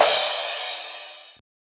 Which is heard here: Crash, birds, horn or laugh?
Crash